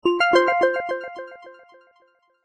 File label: levelup; kapman; games